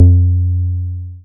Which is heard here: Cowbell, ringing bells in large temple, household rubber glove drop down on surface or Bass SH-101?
Bass SH-101